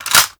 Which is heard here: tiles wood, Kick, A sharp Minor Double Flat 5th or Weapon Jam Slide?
Weapon Jam Slide